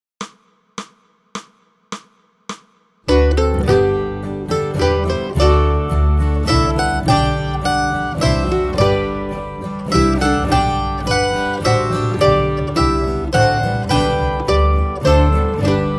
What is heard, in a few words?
Bass; First Noel